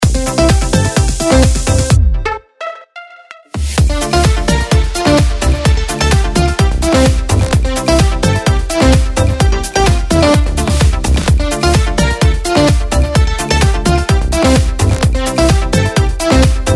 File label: synthetic sax